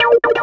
KEY Hold Start

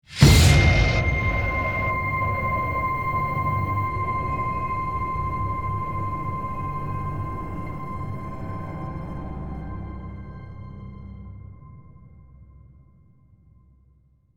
Kill (Stinger); The Walking Dead: The Telltale Series

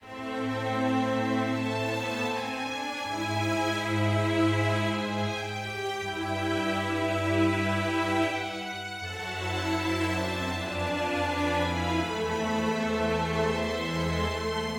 sad forte

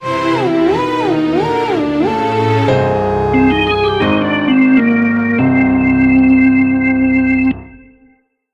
Death Sting

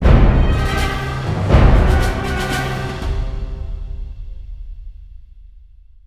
Suspense Action

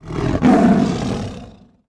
tiger angry; Ringling Bros. and Barnum & Bailey; Wii

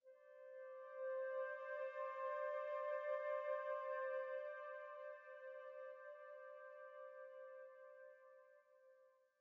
Env Field Night Ground; the legend of zelda tears of the kingdom

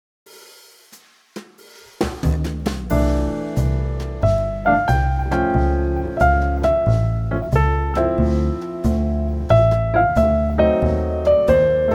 Elegant Jazz

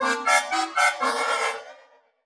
Broken Accordion; Chained Echoes

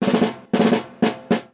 prize idle drum; Ringling Bros. and Barnum & Bailey; Wii

